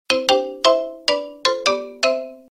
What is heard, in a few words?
iPhone Original Calypso; sms; iOS 4.2